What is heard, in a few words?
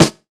natural snare drum sound e key; snare drum samples